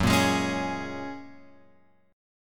F sharp Major Add 9th; F#add9 Chord {2 1 X 1 2 4} strummed

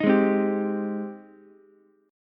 Ab Major 7th Ab, Ukulele; AbM7; 8,8,8,10; strummed; chordshape; JGuitar

